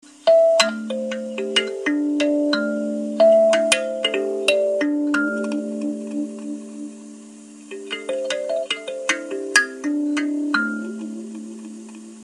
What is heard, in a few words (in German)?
9 Ton Kalimba